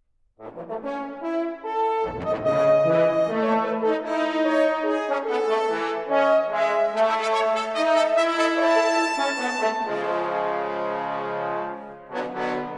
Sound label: Brass Fanfares Liturgiques; Brass of the Royal Concertgebouw Orchest